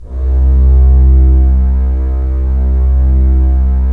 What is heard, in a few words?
STR, Roland LA Composer